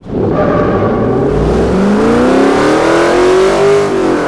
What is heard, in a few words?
first, vehicles; mustanggt; gmod; tdmcars